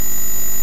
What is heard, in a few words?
screech; Gods